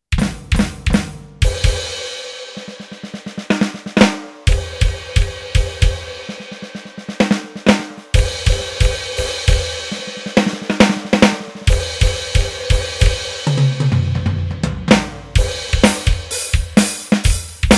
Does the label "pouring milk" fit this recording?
No